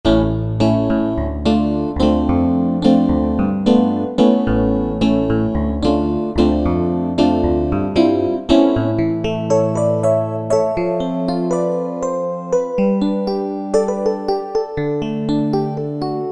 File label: Synthetic Electric; Factory preset demos